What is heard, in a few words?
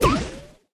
dry fire